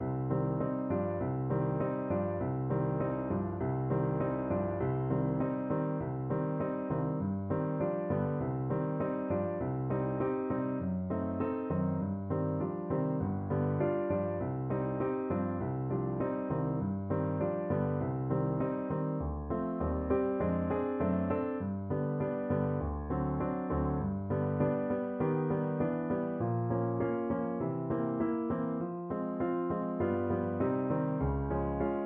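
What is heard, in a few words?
Rach Pno 3, alto saxophone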